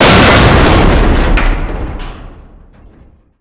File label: airport elevator exp